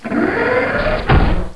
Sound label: side door, ambience; caged